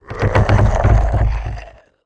Legendary Tree Idle

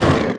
Zombie Dmg

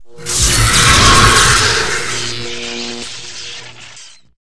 Poison; Leviathan

